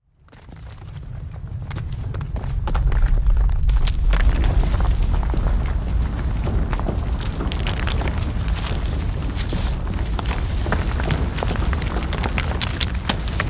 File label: Earthquake building collapse debris large rock destruction